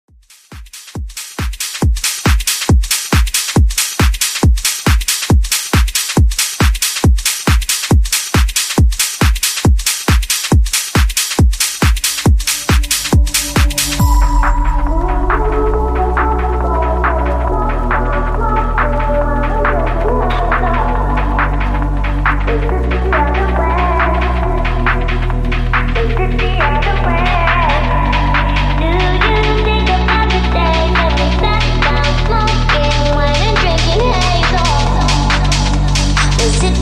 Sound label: 138BPM